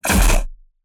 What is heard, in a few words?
Sci-Fi Gun Shot; SoundsCrate